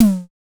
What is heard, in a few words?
DSM2 Tom; MPC DSM-2